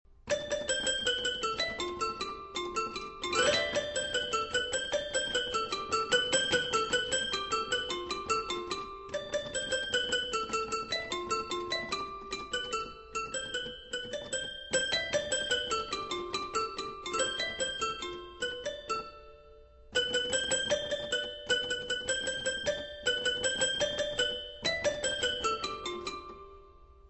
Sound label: Toy Piano